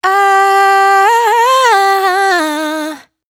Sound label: riff Ah Huh Run Cm, Vocal, Dry Vocals; Raw Vocals; Slate Digital Omnivox Vocal Sample Pack